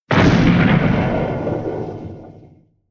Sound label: ka boom